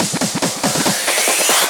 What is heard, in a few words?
Fills, Loops 140 BPM